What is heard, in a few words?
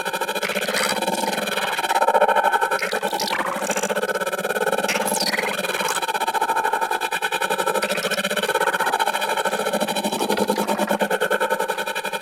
Projector Drone Flight Loop, Ship; Ambience; Props; Warframe; Corpus Ship Remaster